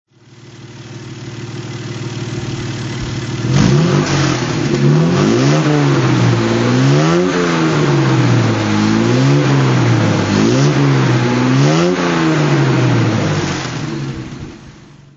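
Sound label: jag mkII 3.4 throttle, Jaguar Mk II 3.4 litre, 1964; Engine sound; V3D/ ClassicarImages, Marc Vorgers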